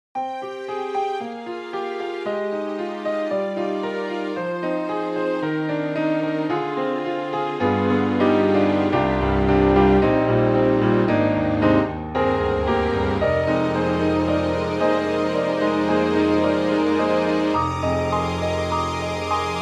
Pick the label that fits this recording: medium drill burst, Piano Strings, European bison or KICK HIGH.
Piano Strings